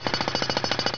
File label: jackhammer